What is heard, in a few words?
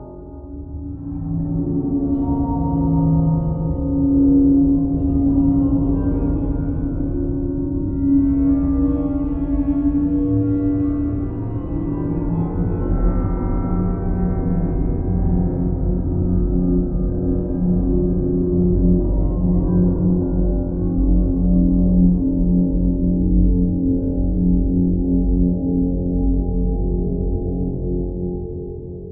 Pad Drone